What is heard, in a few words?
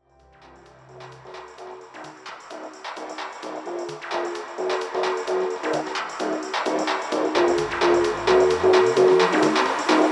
empty bliss